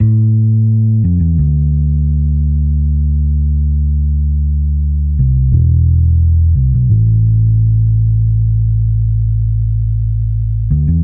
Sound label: Bpm87 D Keep It Raw Bass